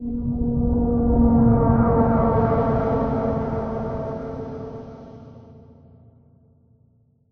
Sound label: ambient abstract deep whoosh; Half Life Model Pack